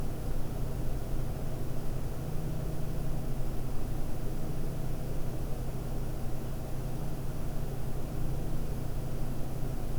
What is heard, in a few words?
1000 RPM